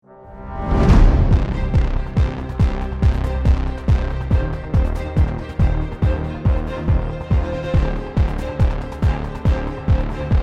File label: bomb planted; music